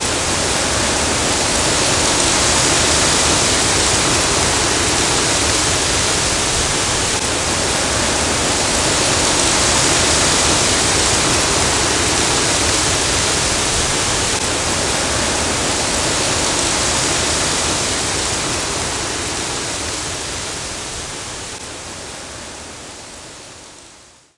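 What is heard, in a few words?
Ambience Golf Crowd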